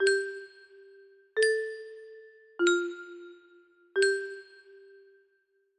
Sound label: music box melody